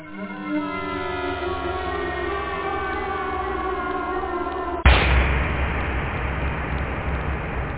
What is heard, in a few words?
bigbang, whoosh; da capo vol1